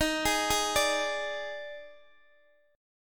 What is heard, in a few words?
D sharp Major 7th Suspended 4th, Guitar DADGAD